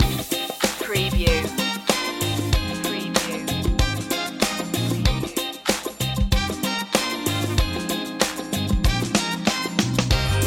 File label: forever funk